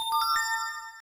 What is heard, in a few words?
notify new message